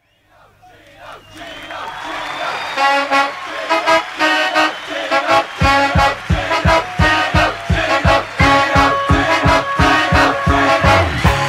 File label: Jingle